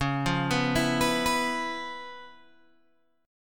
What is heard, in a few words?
C sharp 7th Sharp 9th, C sharp, down, Guitar, Open G